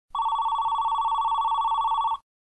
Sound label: ringing